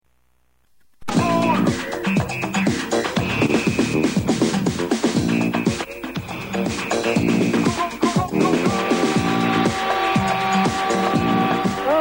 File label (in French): jingle goal